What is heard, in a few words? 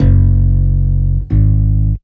BASS, Hip-Hop & R&B; USB Soundscan vol.47; AKAI